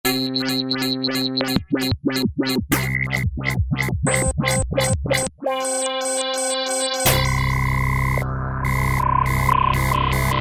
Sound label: sfx-ScrapHit; E-mu Orbit V2; Factory presets